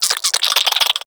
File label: Infested Minion Attack, Vocals; Warframe